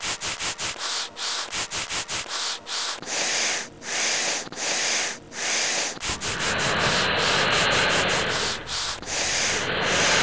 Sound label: drone